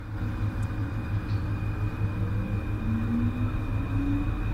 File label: start, Metro